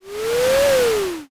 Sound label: ship pass